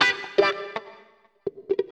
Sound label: 125 g, guitar licks; Vocal House; PS-28 Vocal House Samples; instrumentloops